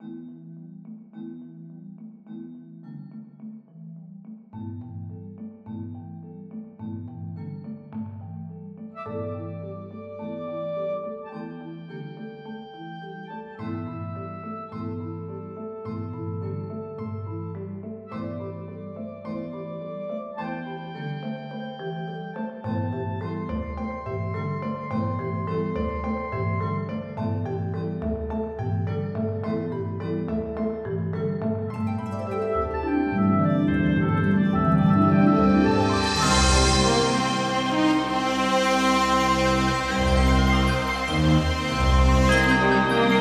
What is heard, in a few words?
GTR